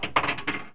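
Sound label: Drain, pinball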